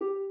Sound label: Harp